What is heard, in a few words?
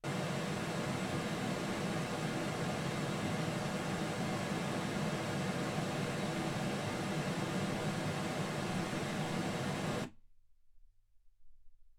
pink noise 48kHz